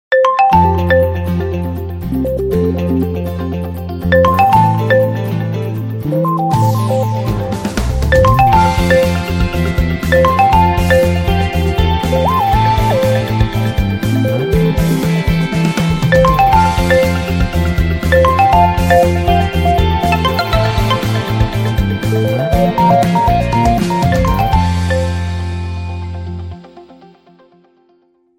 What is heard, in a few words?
Vivo V15 Pro